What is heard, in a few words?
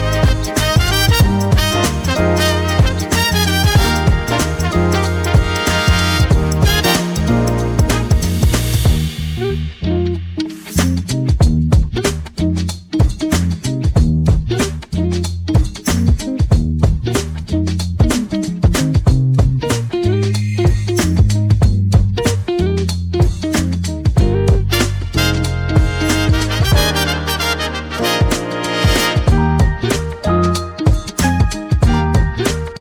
whimsical echoes